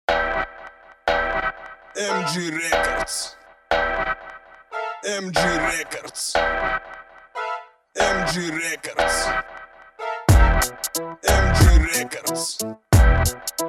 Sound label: instrumental